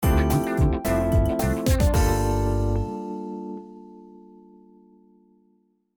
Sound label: Level Cleared; block breaker deluxe collection